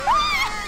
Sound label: Scream death; IRyScream; EDF